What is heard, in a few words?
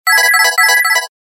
Serene tone; Samsung; SGH-G400 SoulF